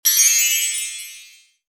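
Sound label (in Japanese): kirakira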